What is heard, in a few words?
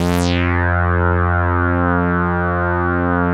saw res dry, synth